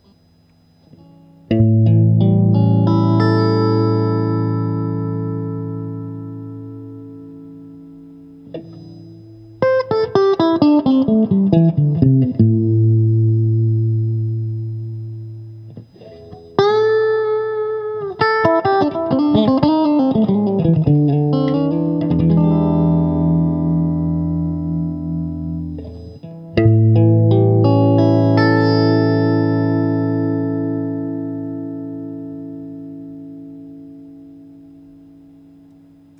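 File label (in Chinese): 60S OCC 单晶铜 清音 2档, acoustic guitar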